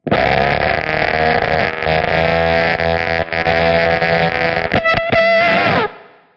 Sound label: dying amp driller, Guitar Noizes